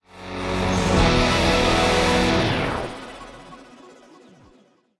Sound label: Completed; Rocksmith